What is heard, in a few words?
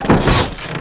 spring, sled; WhiteOut